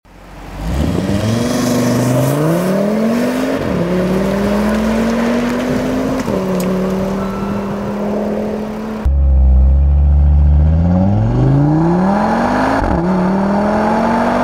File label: Audi Q3